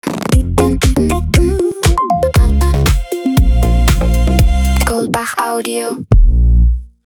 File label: Audio Branding Long; Goldbach